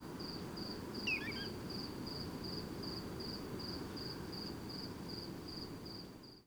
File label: disorganised